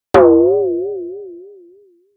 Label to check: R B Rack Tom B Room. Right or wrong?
Wrong